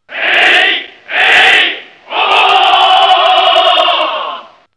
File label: victory; dojo